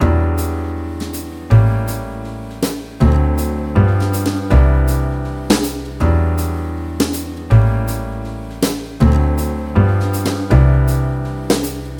jazz3625

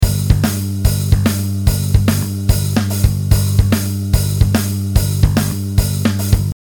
poly fly drum

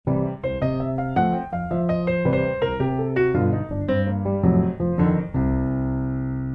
bluenote scale

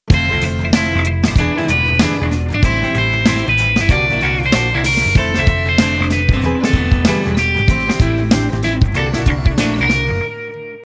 shuffle

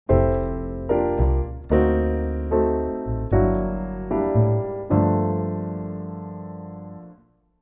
voicing block

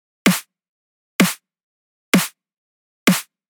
env bad edm snare

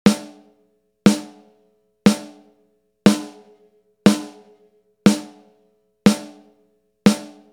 env good snare

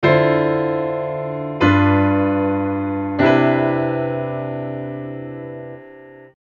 voice leading

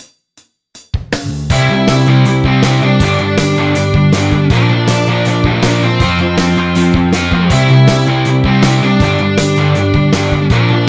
rock

